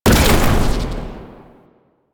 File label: Shotgun Shot Fire; Weapons; Warframe; Pax Duviricus